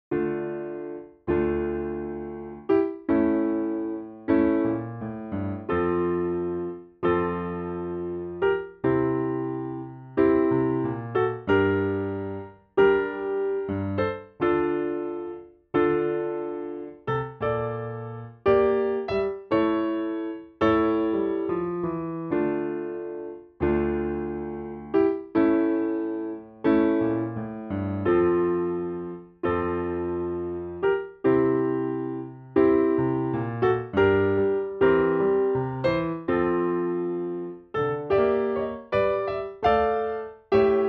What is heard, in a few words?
sarabande, piano; Sarabande d'Haendel - partie 1